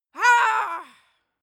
female cheer

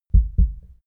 heart beat double

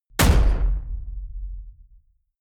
sci-fi space pirate gun fire bright; pistolen gewehre

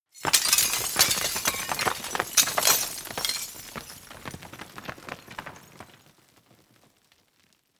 roll fall; industrie wirtschaft; baugewerbe

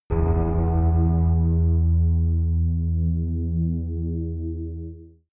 synth hit; whooshes rises

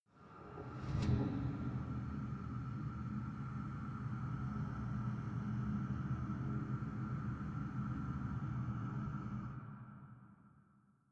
dark working machine, maschinen atmosphaeren

